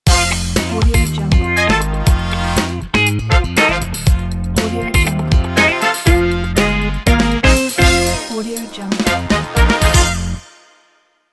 big band logo

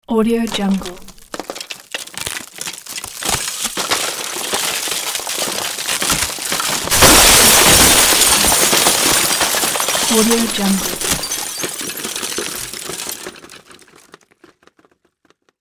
ceiling collapse; sound ideas

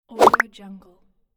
popping swoosh

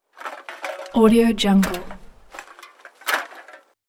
throw aluminum cans

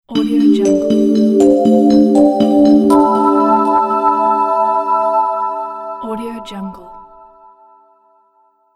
nice bell logo

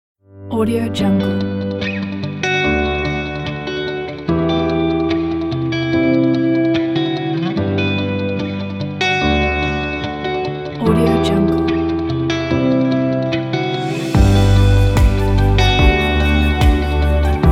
ambient atmospheric guitar

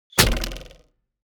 weapons bow arrow arrow hit

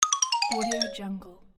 marimba fall

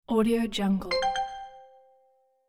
notification

